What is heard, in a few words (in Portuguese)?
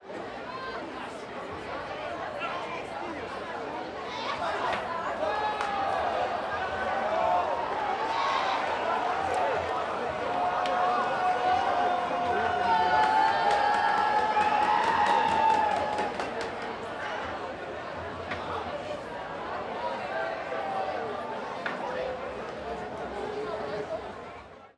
Multidao de Adolescentes em Bar Comemorando Gol